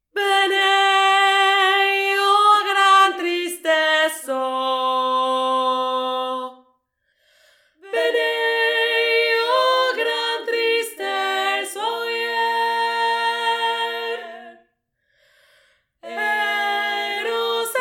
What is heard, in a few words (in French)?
VOIX AIGUE